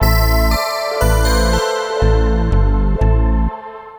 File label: celtic, ringtones; Google; NewWave Labs